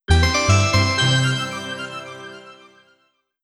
JINGLE Emerald Get; Sonic and the Fallen Star

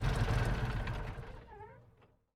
vehicle tank turnoff; teardown ps5 windows xbox series xs gamerip 2022

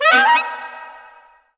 mus mega sax death; Stranglehold; PS3, Windows, Xbox 360

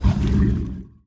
vehicle mediumboat ignition; teardown; ps5 windows xbox series xs

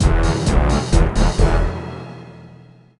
Sting Player Down; Monsters vs Aliens; Missinglink

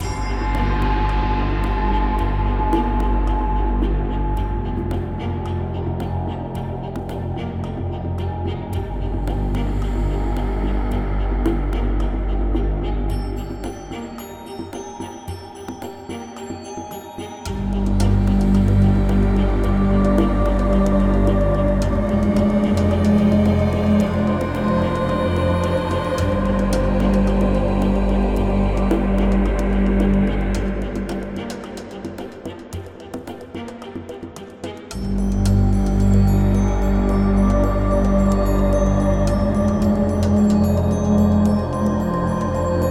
Effects Music; Death Penalty: Beginning

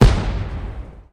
Gun; ChooChoo Heroes

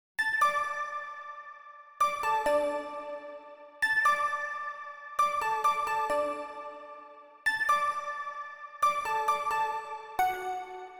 Pluck Melody

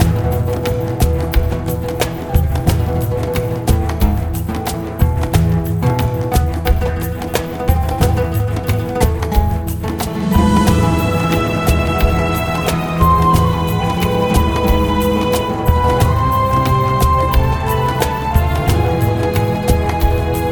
ARABIA MUSIC ARABIA COMBAT; Dungeon Defenders Eternity

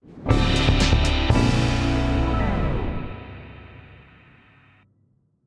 Completed; Rocksmith

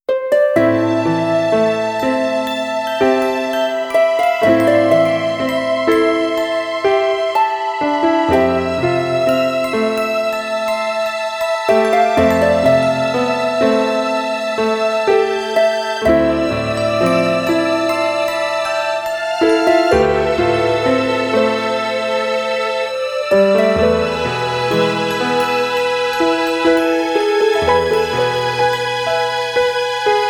Sadness in heart; D.A: White

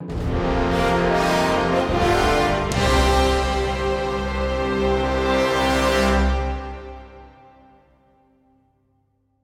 Level Up Stinger

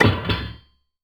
Manhole Open; the princess and the frog; Diner; Light Buford

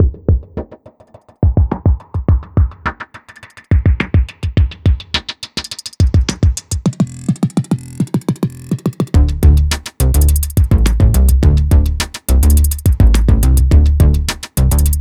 game music regular

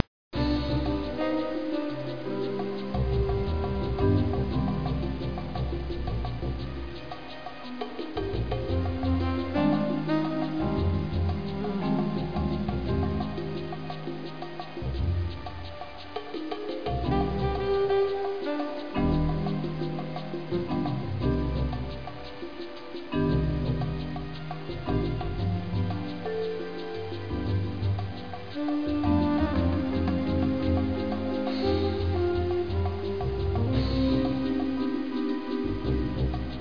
Water; Spy Fox in Dry Cereal

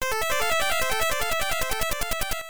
Jingle; holy diver family computer

